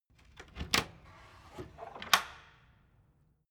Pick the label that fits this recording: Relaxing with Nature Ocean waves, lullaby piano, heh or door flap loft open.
door flap loft open